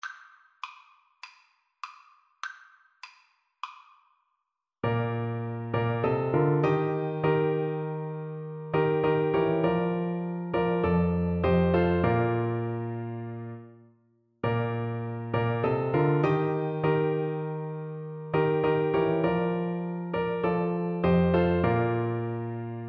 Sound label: bassoon